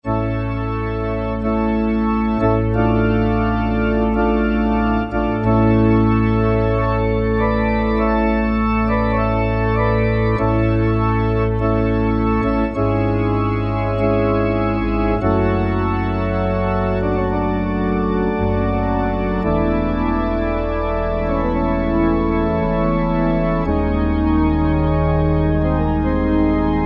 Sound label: syn, E-mu PK-6; The Praise